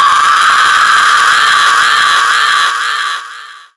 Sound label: Siren Scream; zombie plague